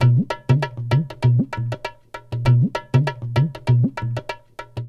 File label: Dholki; loops